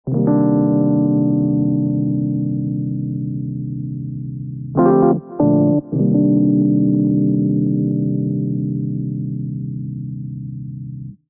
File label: Muddy Whirl Piano C Minor 85 bpm; MIDI Clips; Tonal; Accompaniment; Ableton; Factory Packs; Chop and Swing